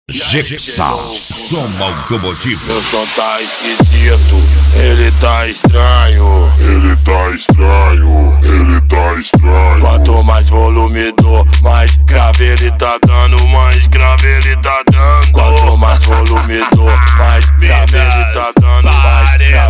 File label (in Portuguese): som automotivo